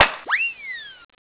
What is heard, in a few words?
catapult fire; Bounce Touch